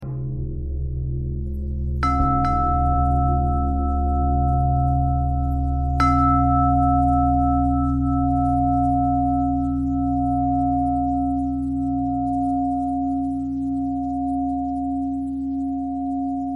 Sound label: bell bell thread simple, bells